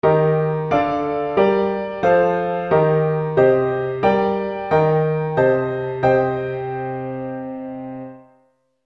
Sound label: functional harmony